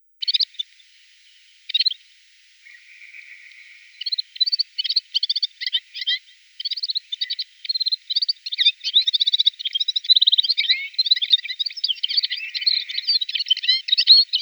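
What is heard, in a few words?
Vjurok korolkovyj, fire-fronted serin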